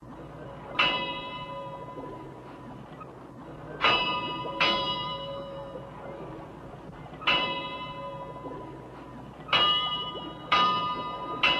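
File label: Ambient Bell Buoy Loop; silent hill homecoming 2008 gamerip pc ps3 x360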